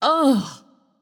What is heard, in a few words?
nv ugh; smite; voicepack; morgan le fay